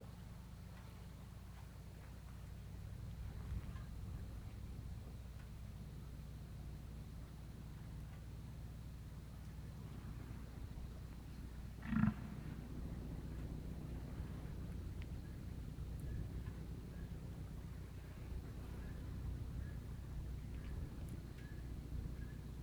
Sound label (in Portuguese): Ambiencia rural a tarde com vento e motor longe que depois somem, passaros; mosca